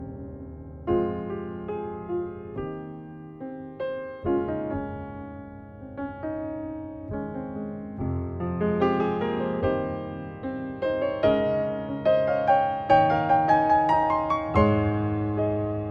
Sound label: Piano reprise